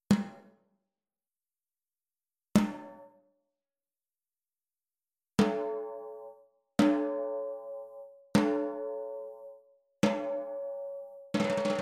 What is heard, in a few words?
PEARL MAHOGHANY 14X65, CAISSES CLAIRES